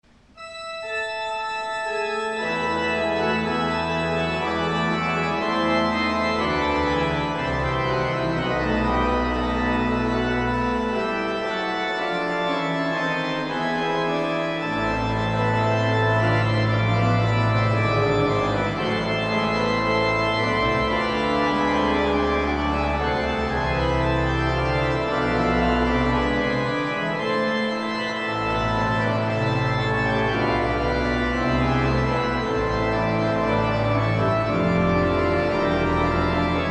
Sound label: Organ, Chorale Prelude; J S Bach; BWV 620